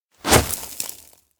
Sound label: dive into hole upper; chiharu; anemoi